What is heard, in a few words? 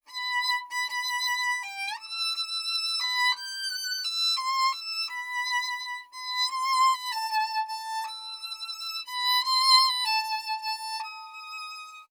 performer dry ensemble